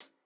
hall resonance hihat

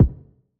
Hiphop kick; Reverb+EQ+Comp+Limit

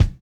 kick, house

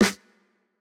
Jrock snare

